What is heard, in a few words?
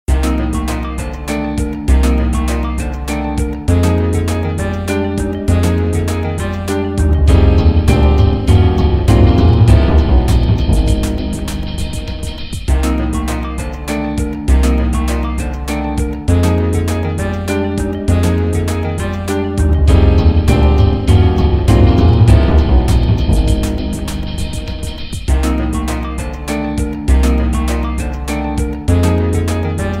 Underground; SMM2; SMB